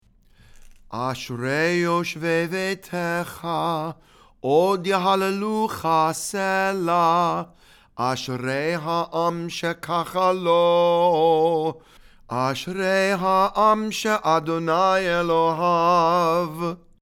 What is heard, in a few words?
ashrei read and sung